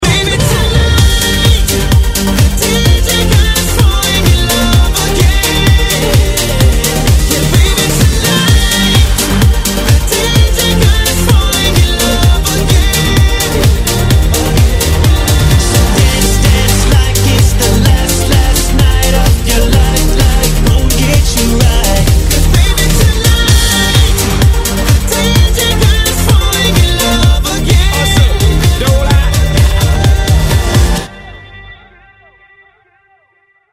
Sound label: Melody, RingTune